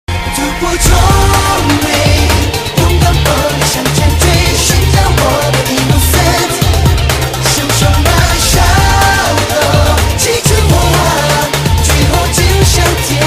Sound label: Rising Chinese